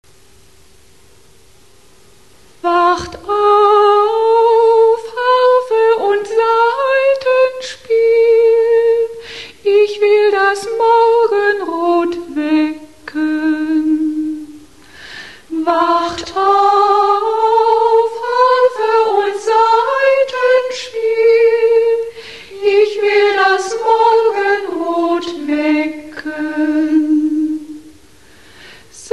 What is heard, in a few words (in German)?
Harfe und Saitenspiel